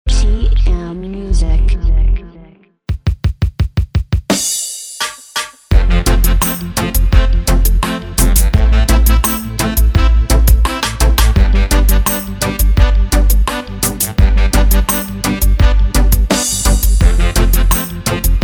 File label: DEEP ROOTS RIDDIM 85